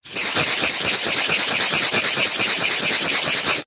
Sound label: foley abrasive foam rub clean or sand concrete circular motion